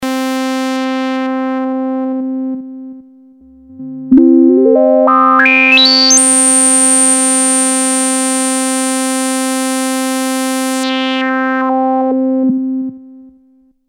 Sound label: low pass with resonance, Roland JD800; XP: LPF filter; a multimode (lowpass / highpass / bandpass) digital resonant filter TVF is assigned for each PCM layer.; synth; HEAR low pass with resonance